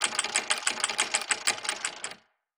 Pulley

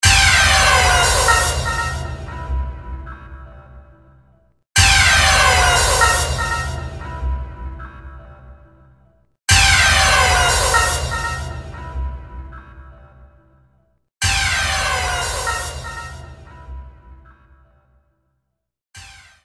Level down; Buzz! Brain Bender; PSP

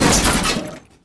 Land Hard; Test Drive Off-Road 3

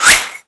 Bonus Results Neutral

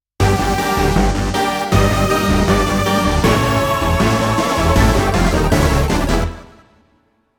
Transition

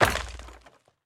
Break Furniture

